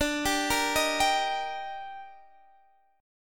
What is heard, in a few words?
D sharp Major D, chord; D#/D; arpeggiated; chord shape